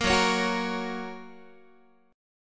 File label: F sharp Major F sharp Guitar; strummed; JGuitar